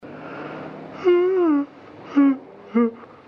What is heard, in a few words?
yawn